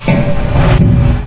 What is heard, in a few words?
heavy stop; source engine; hl1